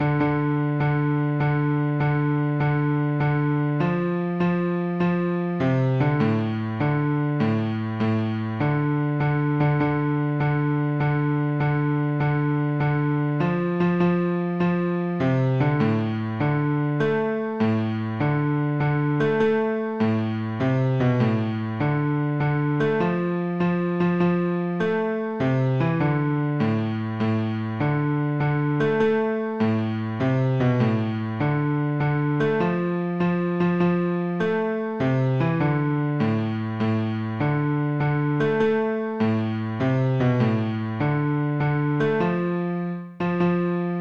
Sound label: Bass Part; choral; These are individual parts broken out for songs in the choral ball set